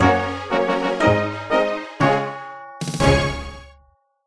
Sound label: Dice win; Death Plateau